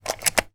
household cell phone charger mains plug remove from wall socket